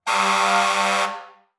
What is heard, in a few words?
Transportation Fire Truck Honk Air Horn